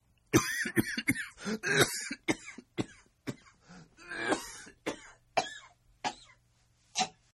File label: Cough Up A Lung, Sick; Sickness Sounds; Human